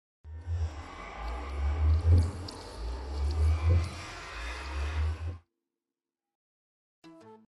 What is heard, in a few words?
nether portal transition; minecraft; audio meme